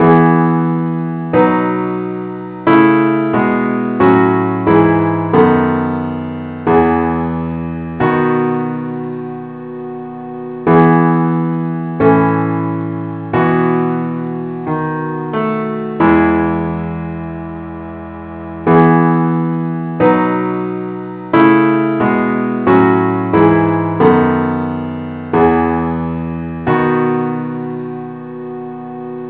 parts only key e; gwalchmai; GLORY BE TO GOD ON HIGH

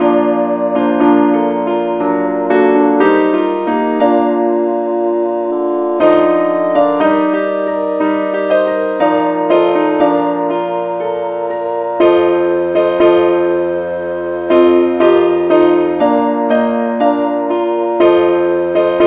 keyboard key ees

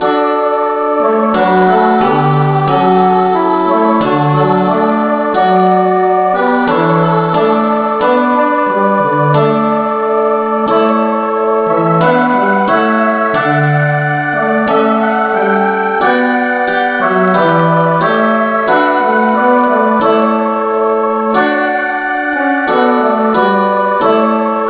christe Sanctorum no vocal key d

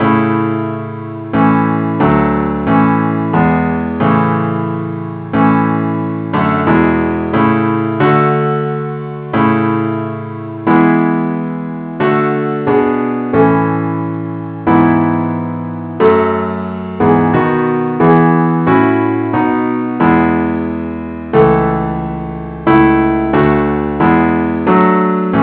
parts only key a; christe Sanctorum

